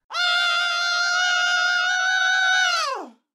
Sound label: scream; skarlet mk9